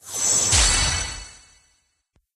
Pack Flip Rare; Athenion; Android, iOS, Mobile

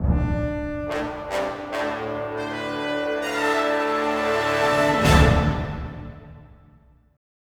Gun Stinger

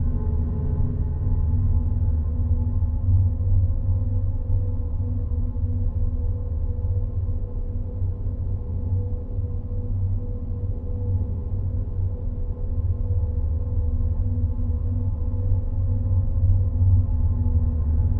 After Fight Ambient; Clown s Fight

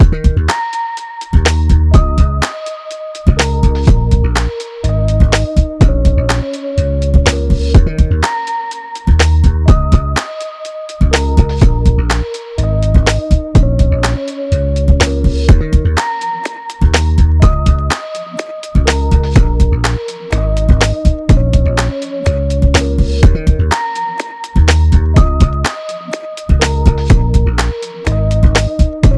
Funky 124 BPM; nitro karts racing; ps4 ps5 switch switch 2

